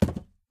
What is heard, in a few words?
phys cube, physics